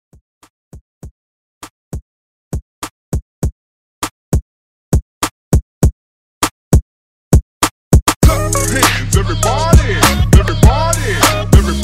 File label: Transition